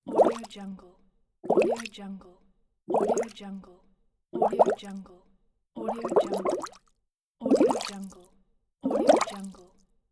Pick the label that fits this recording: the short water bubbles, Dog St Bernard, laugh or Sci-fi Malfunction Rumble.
the short water bubbles